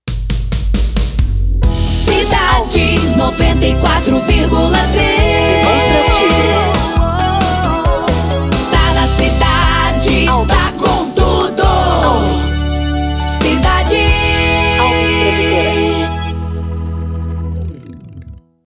vinhetas cantadas; ALVIM PRODUTORA

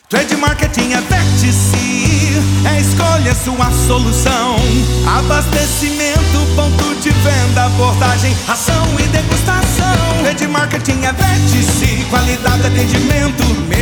JINGLE COMERCIAL; VERTICE; ALVIM PRODUTORA

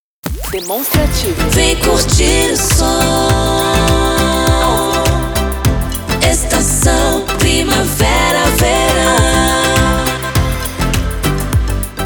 vinheta primavera, vinhetas cantadas; PACOTE PRIMAVERA VERAO 2025; ALVIM PRODUTORA

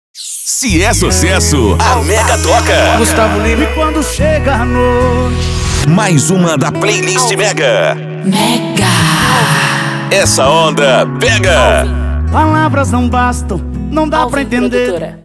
INTRO MUSIC; MEGA FM

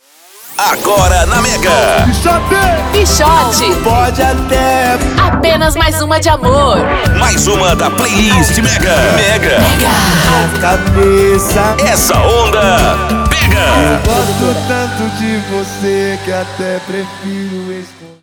INTRO MUSIC; MEGA FM

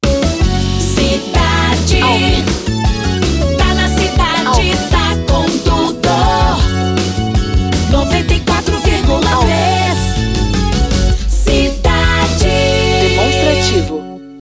vinhetas cantadas; ALVIM PRODUTORA

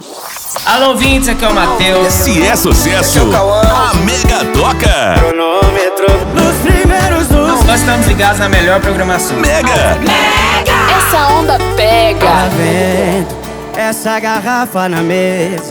INTRO MUSIC; MEGA FM

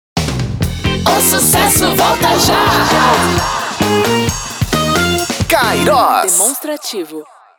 ENTRADA BREAK; PACOTE ENTRADA E SAIDA BREAK

